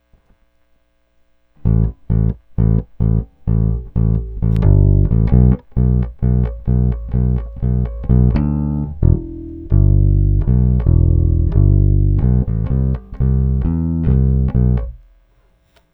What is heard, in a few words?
bass